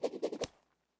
hammer deploy; Rust

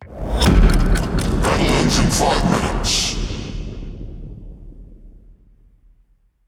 Timer 5; World Of Warships

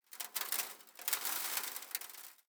ambient; World of Tanks

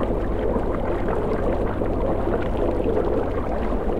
torpedo move loop; War Thunder

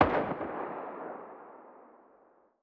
waterpipe shotgun attack far distant; Rust

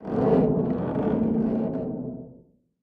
plane metall stress underwater; War Thunder

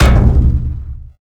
impact barrel medium

